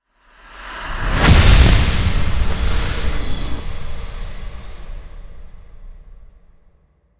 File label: hit swell; Ninja Tracks; leading edge